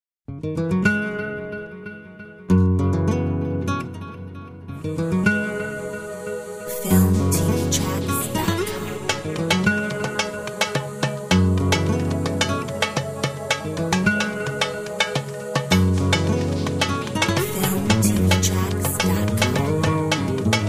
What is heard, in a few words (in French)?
nuit andalouse